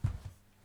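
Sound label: step, Footstep; Standard Assets; First Person Character; krok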